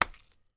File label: Liquid Mud Dropped